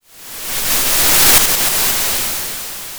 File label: Sound of waves crashing on a shore